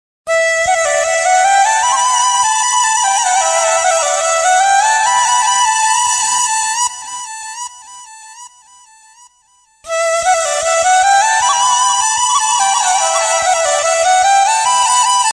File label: love ringtone